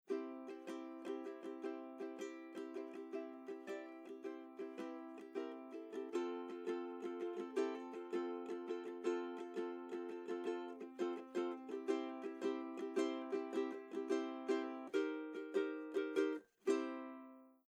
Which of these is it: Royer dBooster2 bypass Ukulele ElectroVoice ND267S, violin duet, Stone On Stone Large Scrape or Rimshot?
Royer dBooster2 bypass Ukulele ElectroVoice ND267S